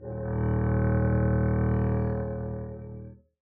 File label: arco eb1 f up, double bass; dsmolken